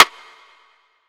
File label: Rimshot; Winter; Cymatics; Trap Starter Pack